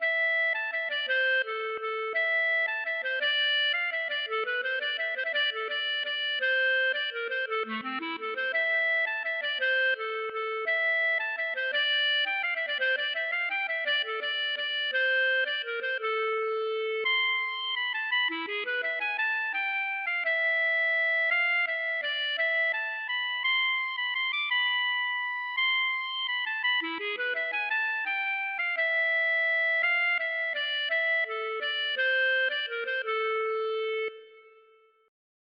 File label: klezmer, Waltz